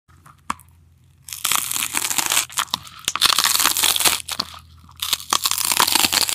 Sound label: eating lettuce